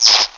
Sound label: spark; Nintendo; July 2020 Gigaleak